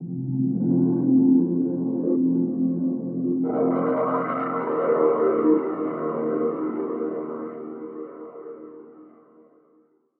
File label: Pad; Ghosthack